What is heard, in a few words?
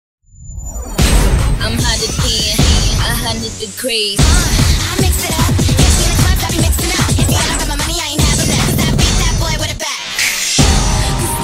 Cheer Music